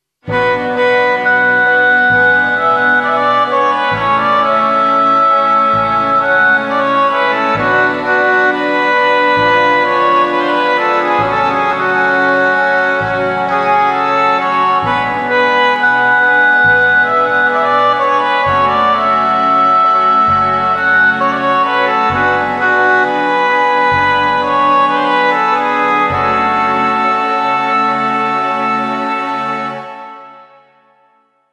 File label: Oboe